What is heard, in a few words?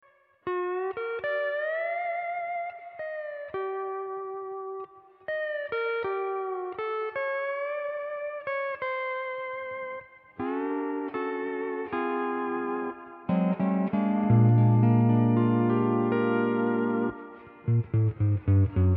hawaiian piece